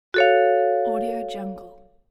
magic wand retro

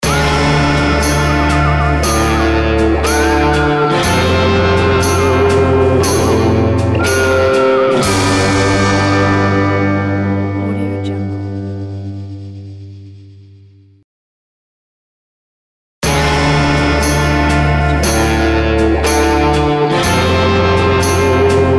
western brave logo

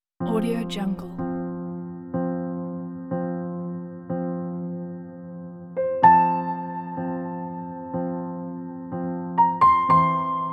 relaxing piano with cello

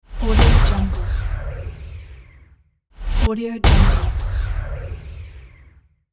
big lazer hit

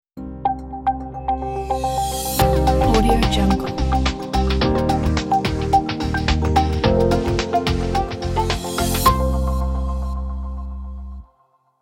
technology logo

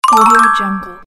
bell ringtone

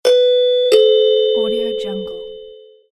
door bell

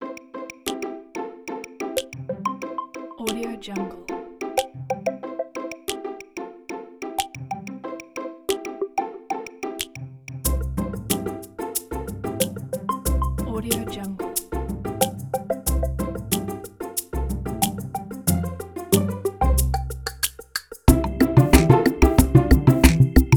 fun childrens game

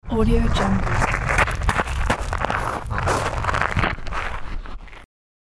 gravel movement

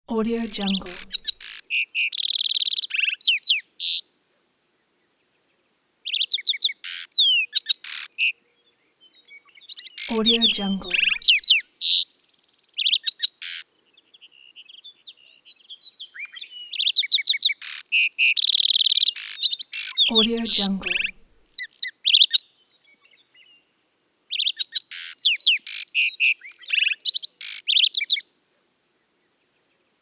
lark sparrow constant calling; sound ideas

